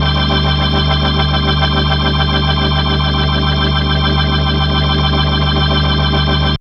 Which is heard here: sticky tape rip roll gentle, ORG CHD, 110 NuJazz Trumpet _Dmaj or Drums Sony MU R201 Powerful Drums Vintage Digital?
ORG CHD